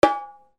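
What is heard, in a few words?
percussion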